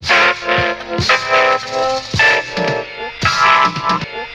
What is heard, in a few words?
keys, Phonky